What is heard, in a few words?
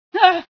scream, female sci